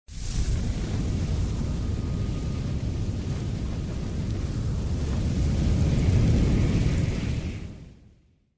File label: flamethrower sound; baileywiki maps premium towns